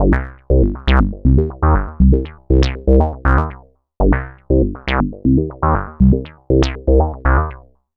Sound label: Bassline, Prototechno